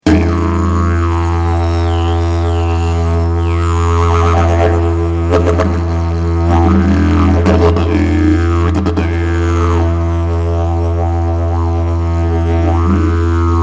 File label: didge